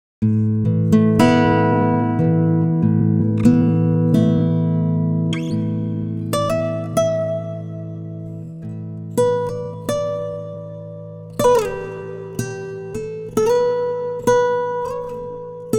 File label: timestretch guitar; digidesign pro tools ts